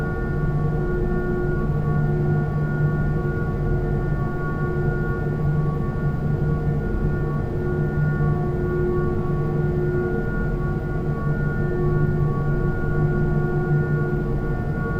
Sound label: V2500 idle, cockpit; A320